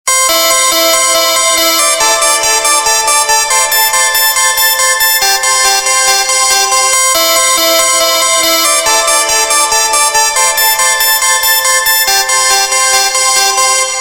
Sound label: Iphone 4s Steel Tone